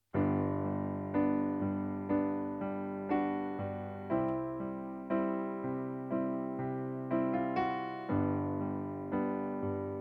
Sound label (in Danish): Sopran; oevefiler